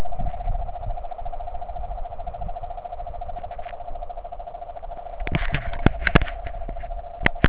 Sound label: jungle